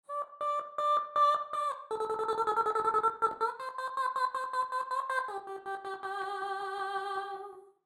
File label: Vocals Legato Aah Step Mod